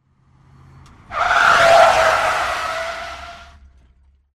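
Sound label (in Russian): резкое торможение с длинным тормозным путем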